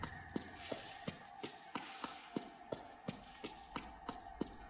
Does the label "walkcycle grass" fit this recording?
Yes